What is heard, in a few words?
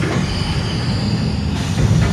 centrifuge; factorio 2.0.55